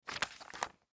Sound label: Magazine Open Cover; Materials; Blastwave; Foley